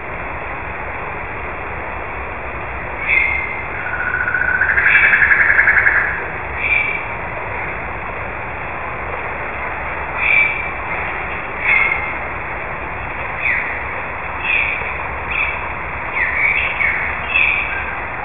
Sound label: Bird waterfall